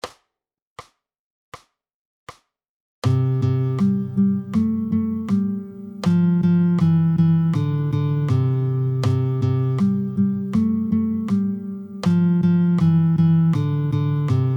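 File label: Twinkle Twinkle Little Star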